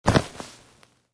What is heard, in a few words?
hitwall